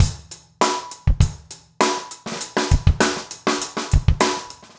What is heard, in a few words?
basic funk 100